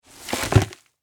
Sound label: Foley Sand Pail Dump Bucket Upside Down Short; Playgrounds